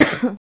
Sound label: cough; bloopers